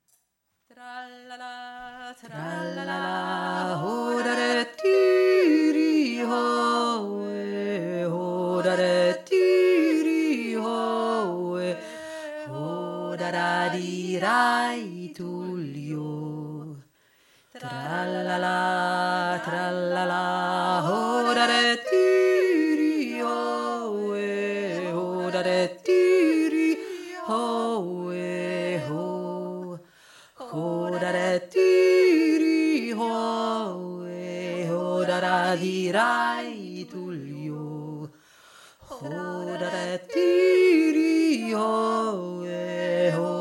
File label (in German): trallala untere stimme